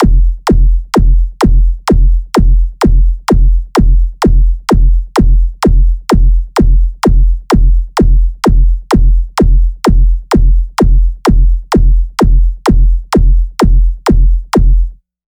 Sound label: Beat 128 bpm